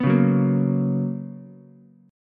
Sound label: B Major 7th Suspended 2nd Ukulele, chord; BM7sus2; strummed; JGuitar